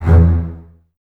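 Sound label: Basses E 2 Staccato; String Ensembles; Miroslav Vitous; 90 s Sample CDs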